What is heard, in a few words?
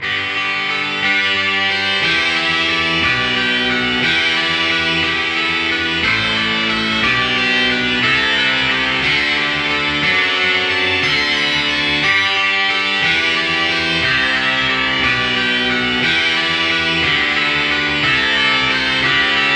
overdrive guitar bach samples